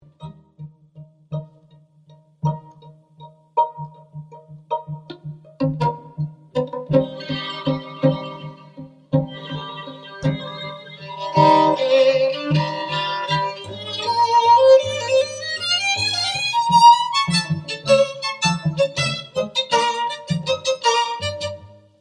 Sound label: string quartet